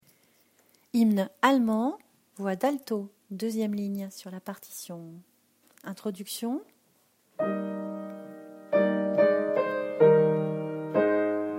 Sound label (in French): hymne allemand 2eme voix altis